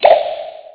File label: Spike, Bounce; Nokia; 6260